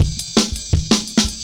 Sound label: Jazz Ride A Cut, Drum Loops, 166.5; Jungle; Zero-G Jungle Warfare 1, 2 & 3; 90 s Sample CDs